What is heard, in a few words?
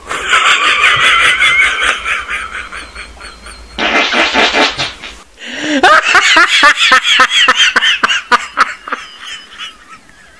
lol brap